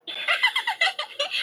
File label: cute laugh